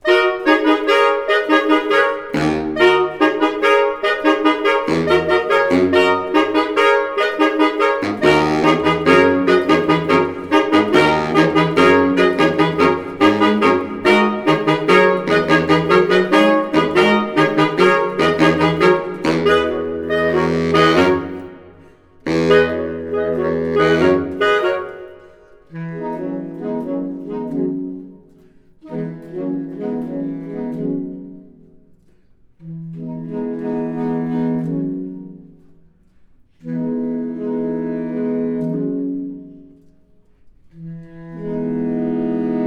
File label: Saxophone Quartet, Courante